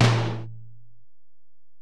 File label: Tom, Alesis DM-PRO